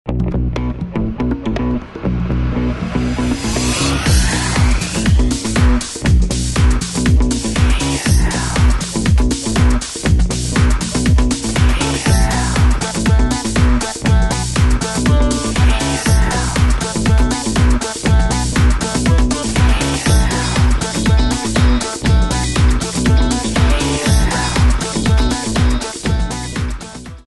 Club Rub - 120BPM, House - Dance

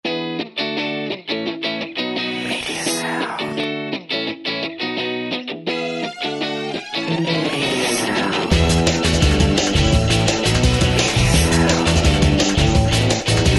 Surf Dude - 170BPM, rock

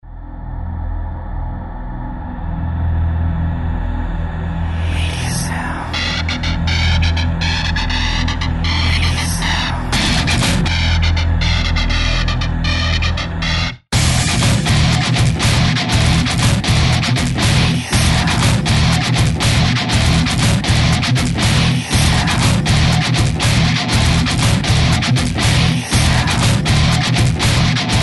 Toxic Zombie - 120BPM, Rock

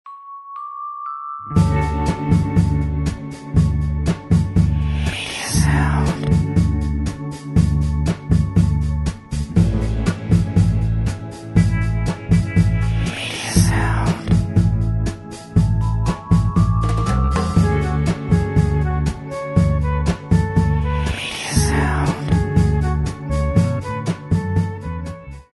Martini - 120BPM, lounge swing

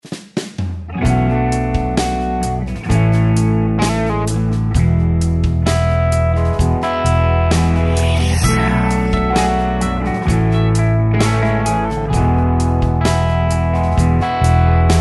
65BPM, rock

